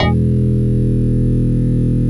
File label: METAL BR